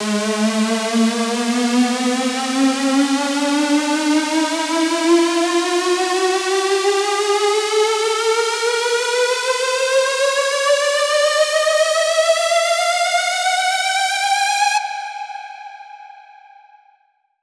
Square Ab 8 Bars

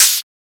Open Hat; FLPRO

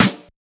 Snare; FLPRO

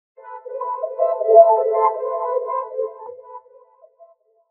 in Wah Wah Syn, Vocoder

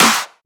Clap; FLPRO

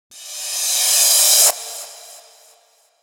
ReCrash; FLPRO

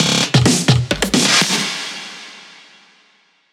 EDM Drum Fill; FL PRO

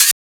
Open Hat; FLPRO

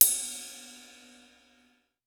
Ride

